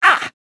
HIT; battlesnds

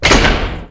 TANK TURRET B

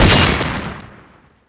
GAS GRENADE EXPLODE

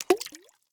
Splash

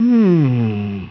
humm; BattleSNDS

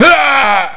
DYING; BattleSNDS

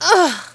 HIT; battlesnds

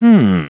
HUMM; BattleSNDS

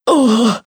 hit; BattleSNDS

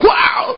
hit; BattleSNDS

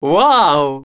humm; BattleSNDS

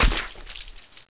HEAD EXPLODING

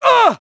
HIT; BattleSNDS

